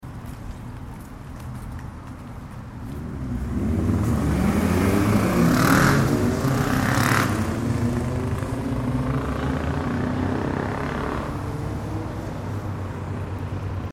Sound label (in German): motorradlaerm an der ampel; gruppe rot